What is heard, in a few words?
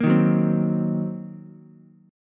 Gb 6th Flat 5th Gb down Ukulele; strummed; Gb6b5; JGuitar